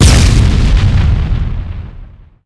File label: pain, human; buildables